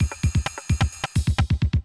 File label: electro kit 130